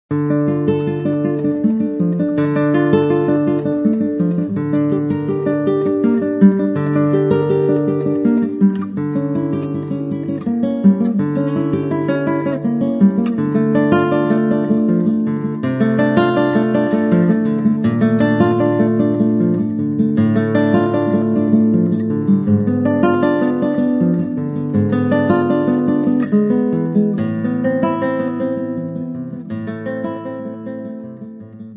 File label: solo guitar